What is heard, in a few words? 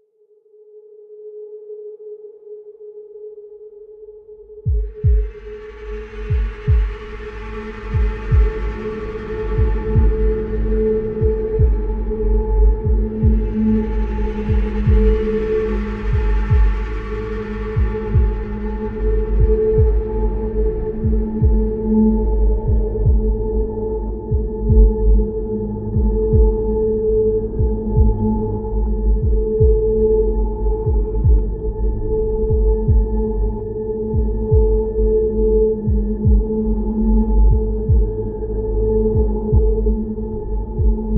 Loading Screen